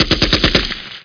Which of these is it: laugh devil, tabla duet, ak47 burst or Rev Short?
ak47 burst